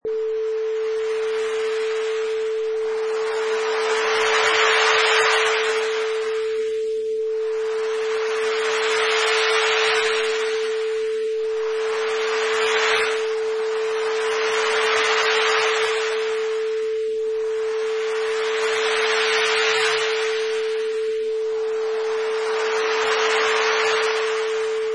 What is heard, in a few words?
Beach Waves